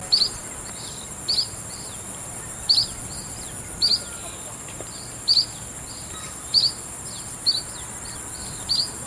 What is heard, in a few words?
Dusky Munia Calls; Amar